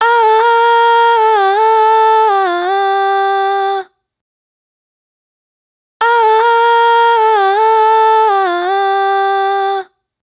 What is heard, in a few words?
riff 80 Gm, Vocal, Dry Vocals; Raw Vocals; Slate Digital Omnivox Vocal Sample Pack